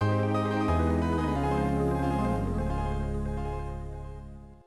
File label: Logo; Plasma Sword Nightmare of Bilstein; ZN-2; arcade